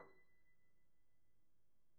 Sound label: piano pp, steinway